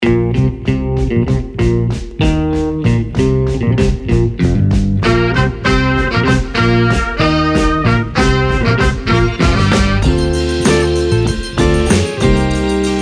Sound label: 60s Soul Groove; Library Tracks